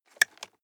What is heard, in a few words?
nylon leather clip